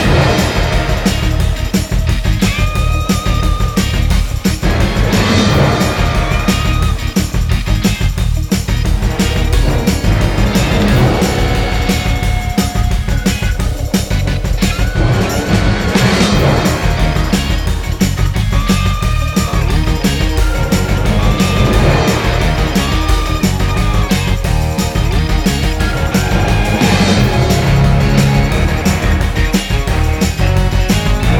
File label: Boss Fight 177 Bpm Loop; We Happy Few